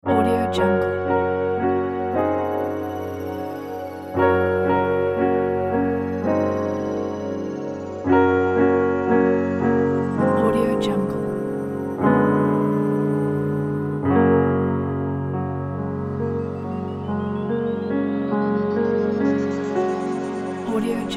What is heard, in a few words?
ambient synth piano logo